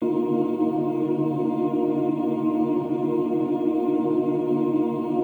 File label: atmos D, pad; M8